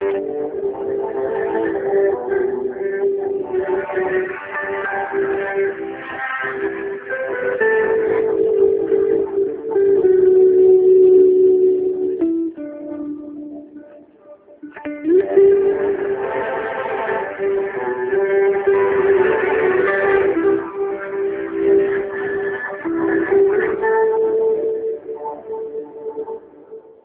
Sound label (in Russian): лирическая мелодия